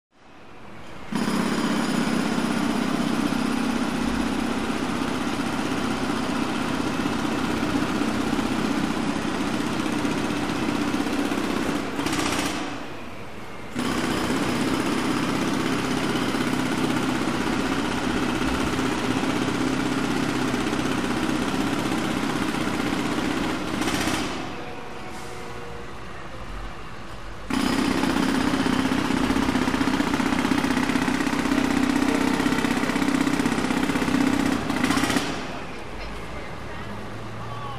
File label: Jack Hammer Close, construction large